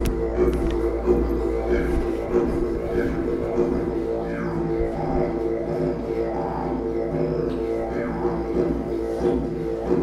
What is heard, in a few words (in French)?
didjeridoo instrument; Australie